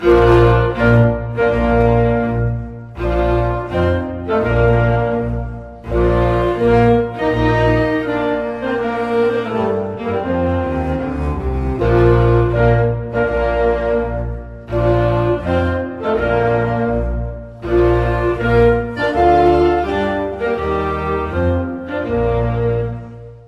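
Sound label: svadebnij marsh, wedding march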